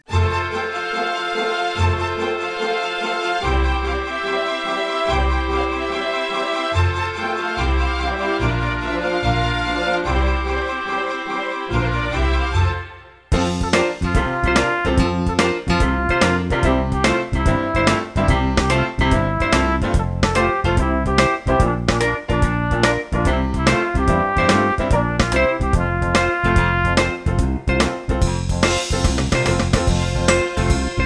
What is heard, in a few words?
Song (Instrumental); Spotlight 2